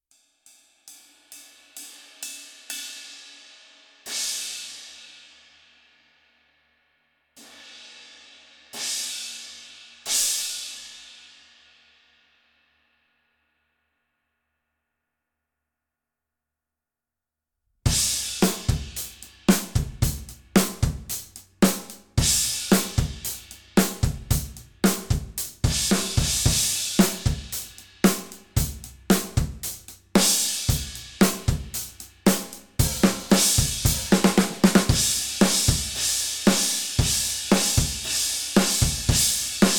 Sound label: Dual Crash